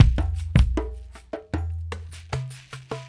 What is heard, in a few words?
Dumbek Grid, Drums; Sonic Foundry (Sony Creative Software); Friendly Fire; Marc Steve